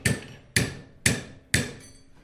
am thanh dung bua dap vao mong ngua de lam thang no

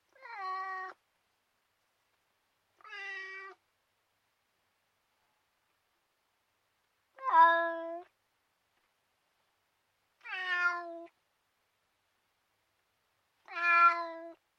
tieng meo keu da dang

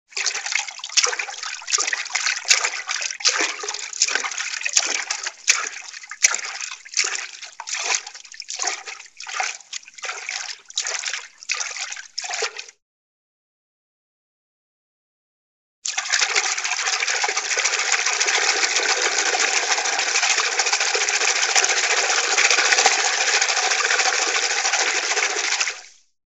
am thanh tieng rua mat bang nuoc

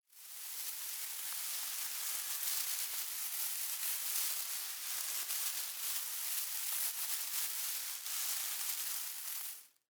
tieng ran bo qua la kho

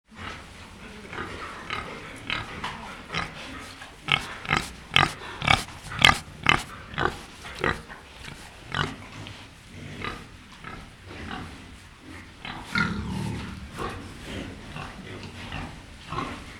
am thanh cua mot con lon lon gam gu

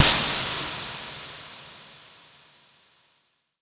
tieng thung lop xe xi hoi ra

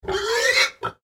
một con lợn kêu lên

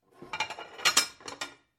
lay mot cai chen dia gom su tu chong chen bat

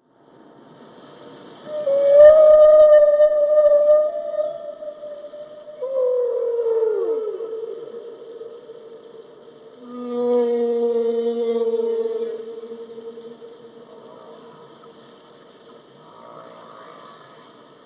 am thanh cua bai hat ca voi

